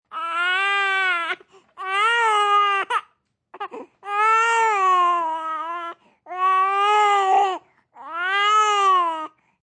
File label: Baby Crying